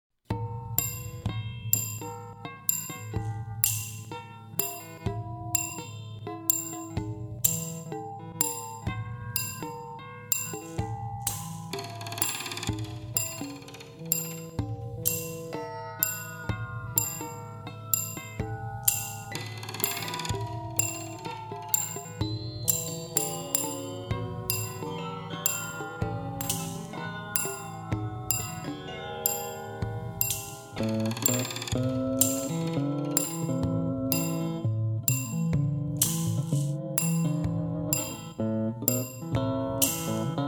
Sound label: strings